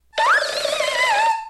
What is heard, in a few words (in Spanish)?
Grito de Phantump